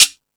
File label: Timbale, E-MU Pro-Cussion